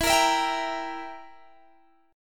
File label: E Diminished E down Guitar Standard; Edim strummed; JGuitar